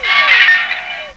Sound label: AMB CRASH; M8; CMI Factory